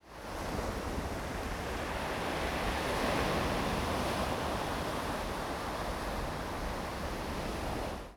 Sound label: sea